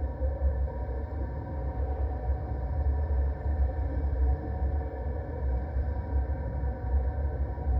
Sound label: incoming message; Stellaris